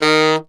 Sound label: Tenor Sax Portato D#2; DirectWave; Varazdin Orchestral